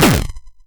Shooting Projectile (Classic); SNKRX

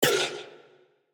Debuff; SNKRX

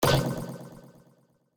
Error; SNKRX

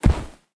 Body Fall; SNKRX

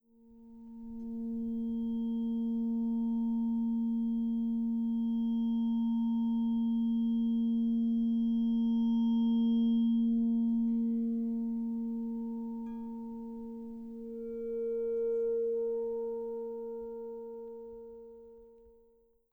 zither loop

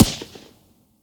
Pistol Shot; SNKRX

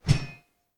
Heavy sword woosh; SNKRX

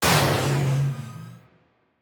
Magical Impact; SNKRX

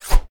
Throwing Knife (Thrown); SNKRX

